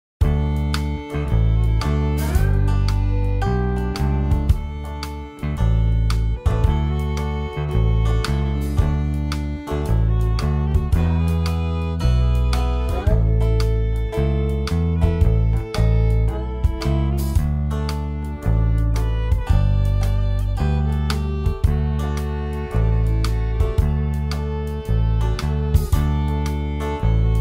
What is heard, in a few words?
western